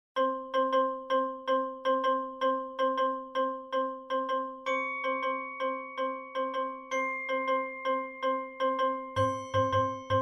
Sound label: Street Abstrakt